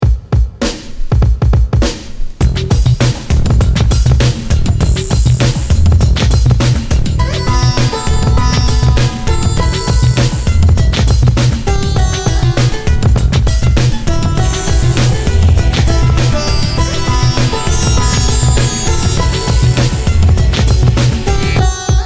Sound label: hiphop